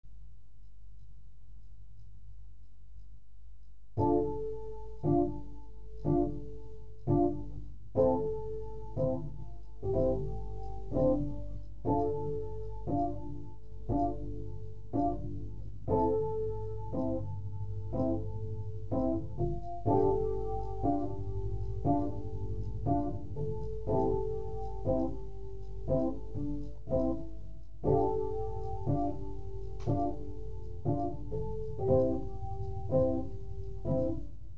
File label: piano